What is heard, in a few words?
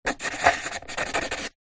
office pen felt tip sign signature on thin wood